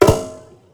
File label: concussive grenade cast; weapons